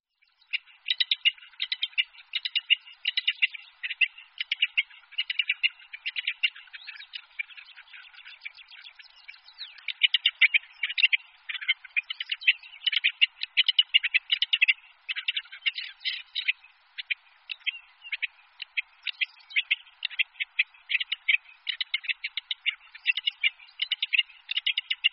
coturnice

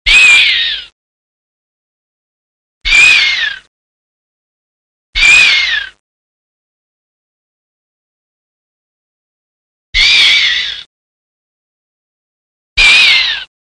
camoscio

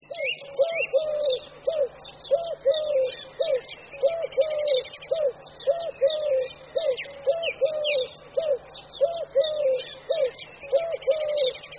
tortora

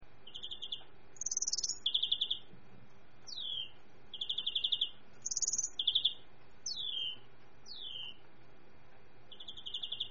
verdone

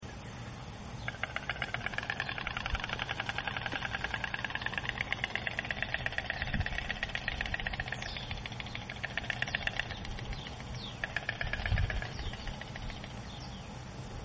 cicogna

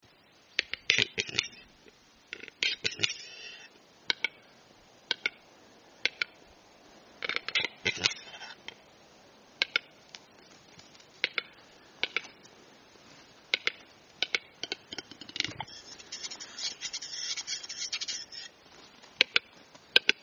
gallo cedrone

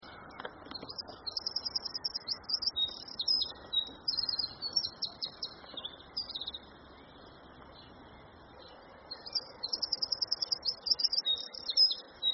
scricciolo

